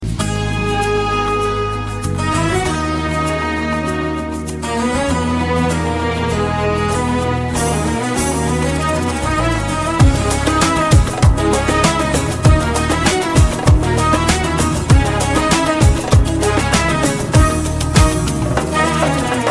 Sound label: instrumental